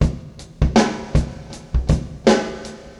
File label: drum; 80 BPM